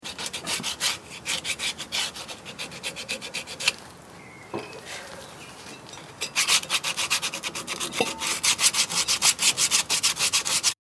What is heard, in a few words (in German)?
Autowerkstatt Schleifen